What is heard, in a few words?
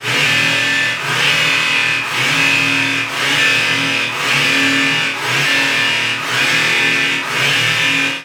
warning signal